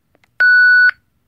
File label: Radio startup